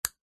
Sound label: household switch plastic small flick